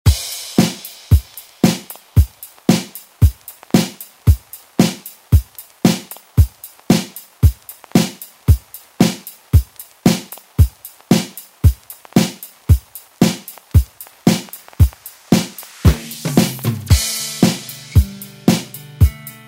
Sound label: 80s Re Drum; DJ Mhark